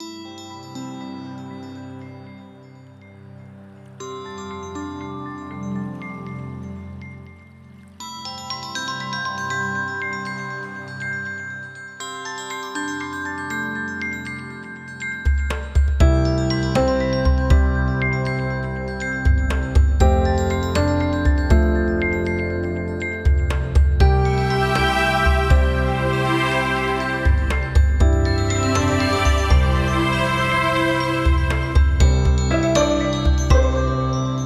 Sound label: Morning Clock, alarms; Honor Pad X7